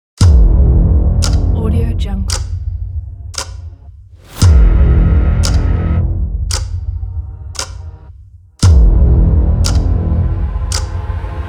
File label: countdown horror trailer